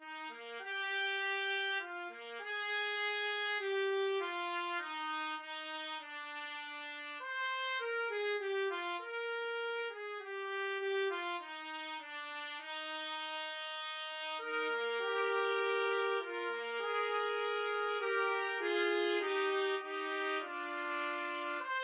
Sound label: trumpet trio